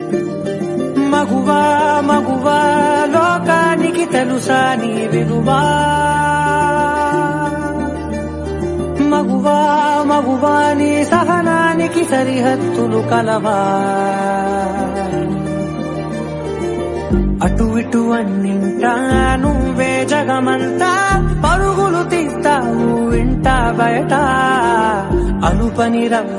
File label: Telugu Ringtone; Vakeel Saab